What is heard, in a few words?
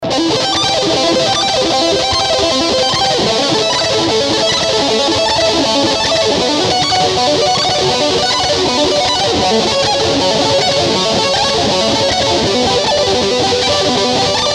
arpeggio mania